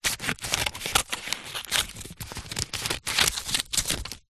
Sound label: office scissors small blunt cut paper sheet; zapsplat